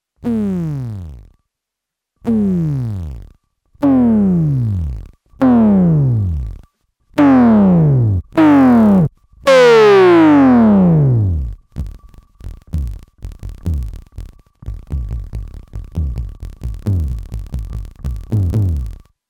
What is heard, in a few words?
Reverse DC Motor audio out, eurorack